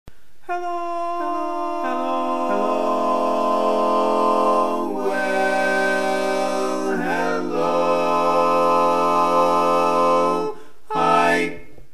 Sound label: Hello Lead, chorus